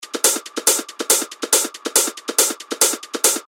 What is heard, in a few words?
140 BPM, techno loops; Sphinx; Revealed Techno Top Loops Vol. 9